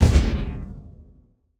Gun, Sci-Fi, Shot, Distant, Cannon, Element

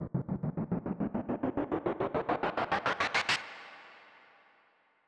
BPM137 Trans FX

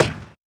Percussion

